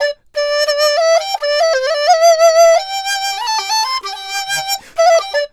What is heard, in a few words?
DIZU, 90; INIS - Opium; DIZU 90-C